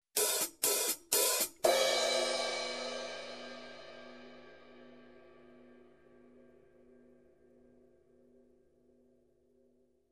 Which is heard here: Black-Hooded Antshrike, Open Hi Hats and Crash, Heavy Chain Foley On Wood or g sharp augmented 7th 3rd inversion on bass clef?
Open Hi Hats and Crash